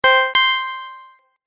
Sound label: Double Ting, dong sound effects; multimedia